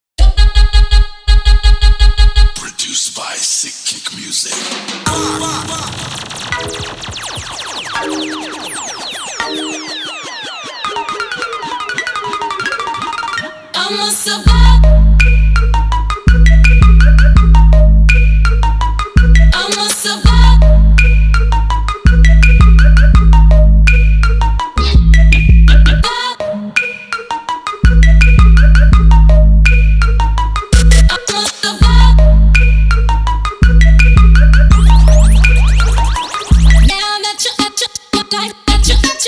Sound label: Trap Remix